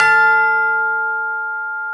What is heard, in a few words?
TUBULAR F4; ORCH.PERC.; Instruments & Percussions; InVision Interactive; Lightware VOL-1